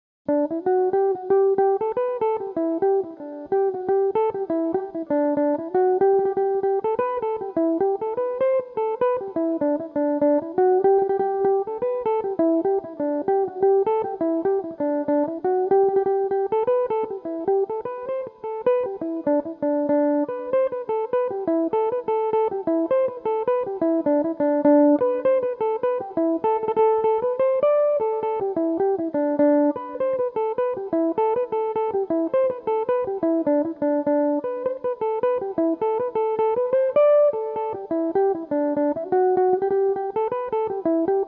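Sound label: G major, irish mandolin, tunes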